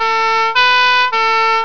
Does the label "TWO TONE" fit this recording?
Yes